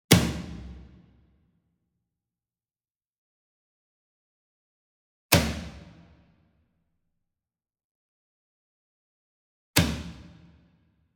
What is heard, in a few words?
Single Drum Tom Concert Hit Whip Beater Medium; boom library; Cinematic Strikes Construction Kit